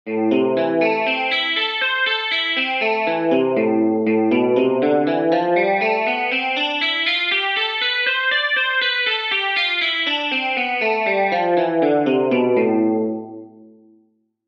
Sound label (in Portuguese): Exercícios Guitarra 120 bpm